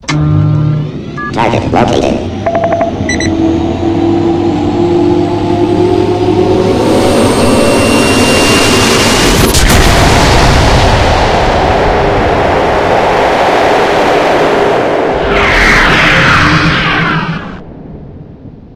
firing; st mission; hs c4; sobrobo

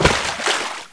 slosh, player; blackops

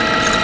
laptop loop; vendetta

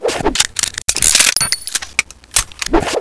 reload; ayakashi

